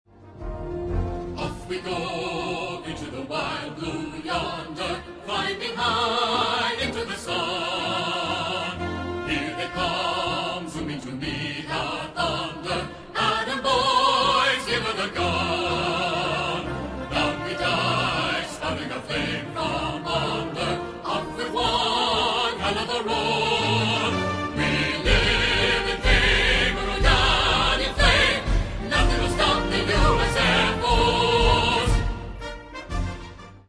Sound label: af song